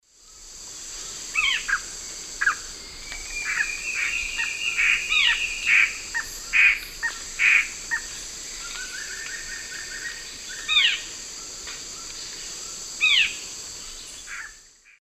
Cercomacra cinerascens, call and song, S. America Eastern Lowlands